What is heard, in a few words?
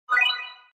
Win